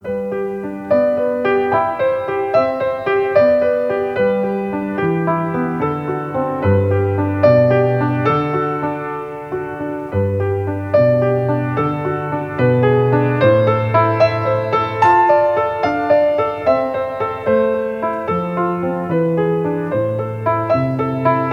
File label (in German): wiegenlied